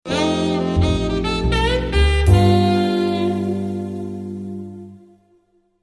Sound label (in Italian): clarinetto